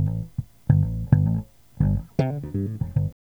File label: BASS